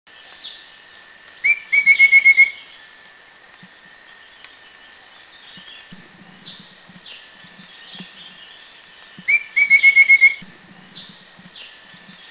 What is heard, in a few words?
Rufous-fronted Babbler